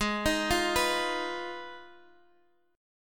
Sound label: Ab Minor 6th Ab, down, Guitar, Half Step Down